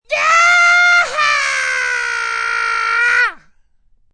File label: Rebel Yell, Screaming a rebel yell; Screaming a rebel yell like only a red-blooded amerkin' can.; Yelling; Voice